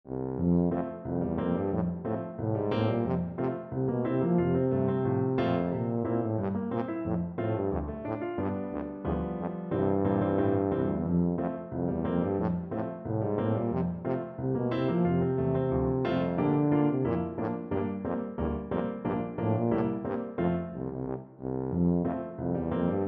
americanpatrol, tuba

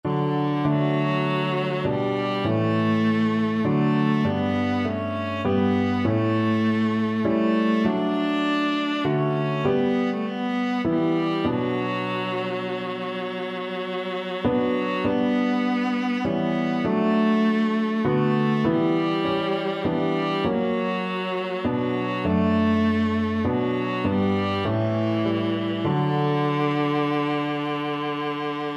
viola

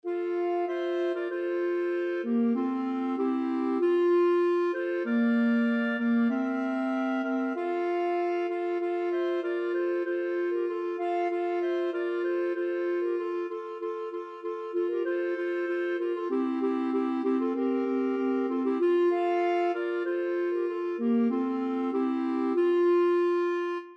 joy to the world, clarinet trio

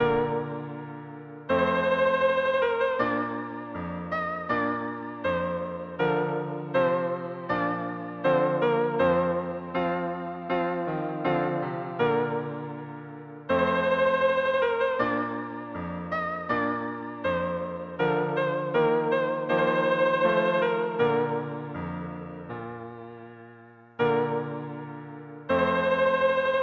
brass quartet, trumpet with horn

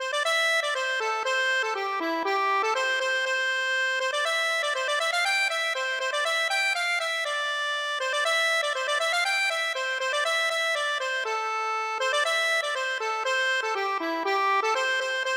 accordion

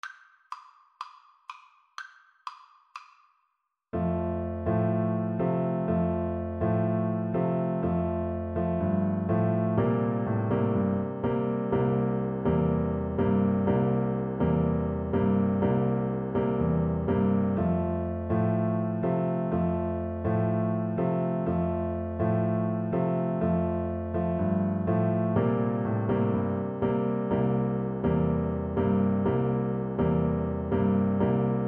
la cucaracha, Trombone

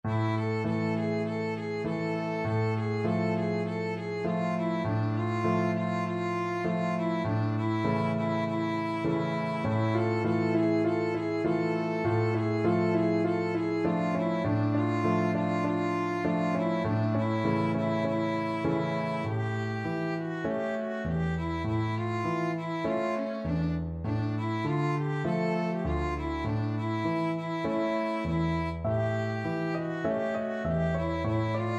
violin; rumelaj

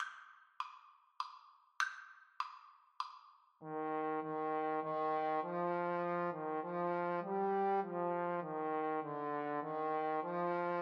Trombone Duet

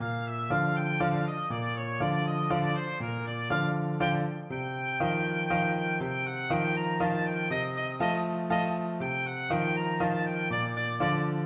fledermaus, oboe

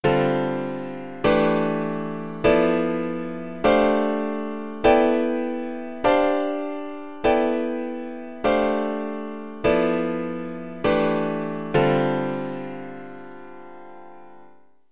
piano

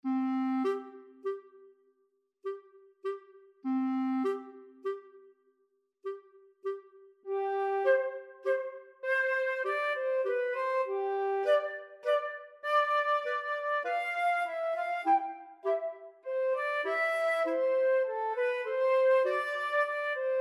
elephant, flute clarinet duet; saint saens

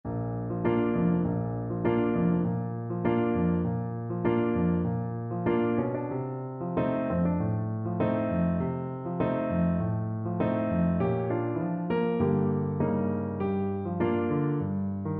tango, Trombone

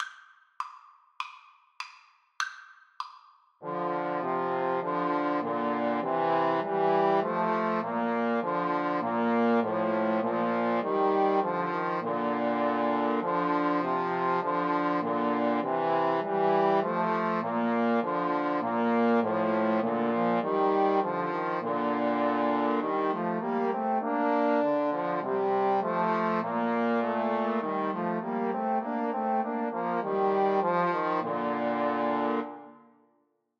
Trombone trio

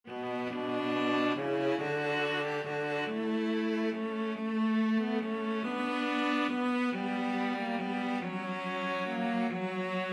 viola cello duet; what child

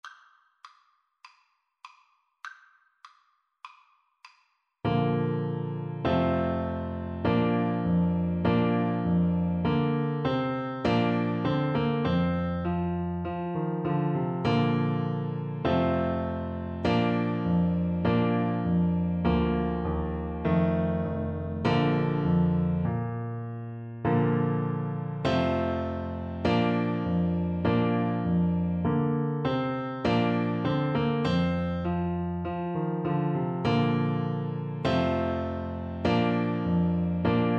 trumpet voluntary, Trombone

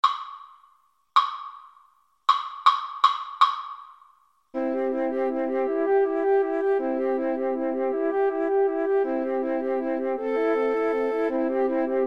Flute Trio

